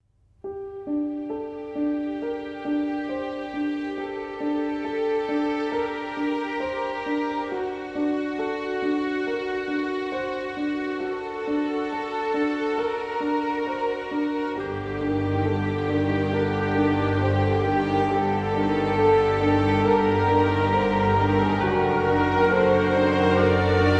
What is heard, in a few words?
Event BGM; Xenoblade Chronicles 3